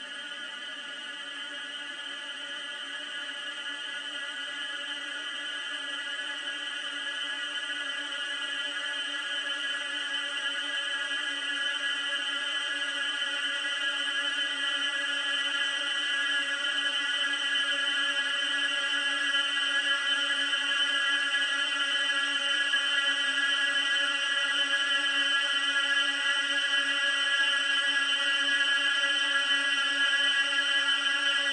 Sample Tri Tones Six; SoundChaser; CerebralAudio

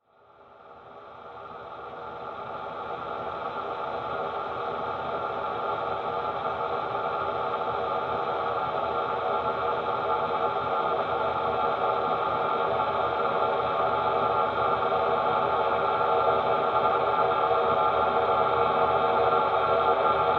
Sample Tri Tones Submix One; SoundChaser; CerebralAudio

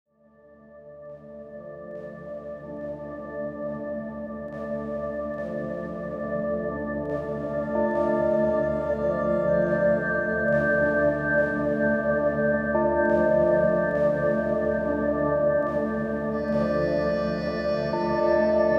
meditative Bells

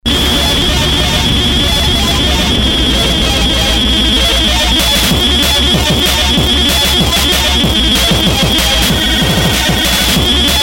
white turbo noise strobo disco; far from showbiz; tracking cookie; star

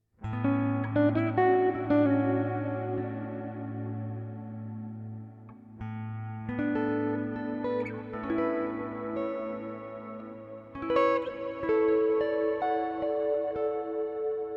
Improvisation

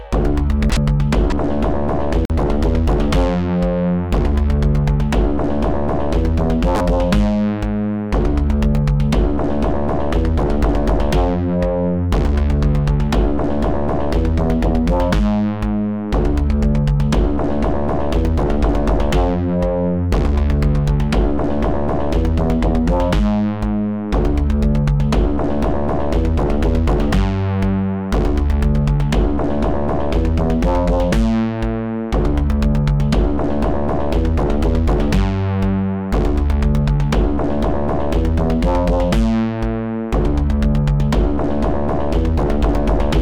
Bass

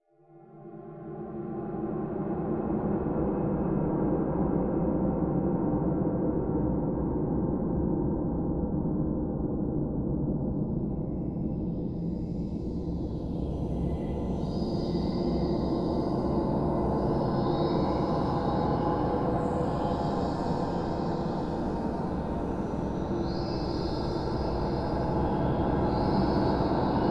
piano birds frozen fall; Burning Artist

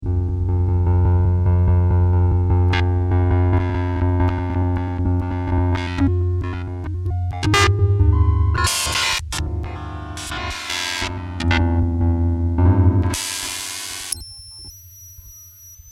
percussion, Piano; Princess

